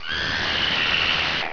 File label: pain, headcrab poison; hl2